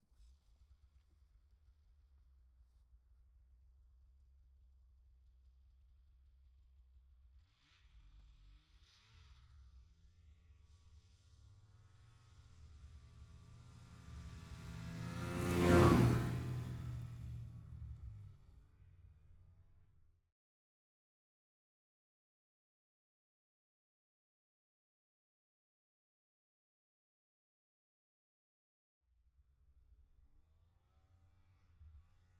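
arctic cat m 8000 ext medium by 5100 rpm, snowmobile; ORTF; MKH8040; 2015; Pole Position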